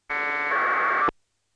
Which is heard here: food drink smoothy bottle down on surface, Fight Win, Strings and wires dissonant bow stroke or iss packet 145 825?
iss packet 145 825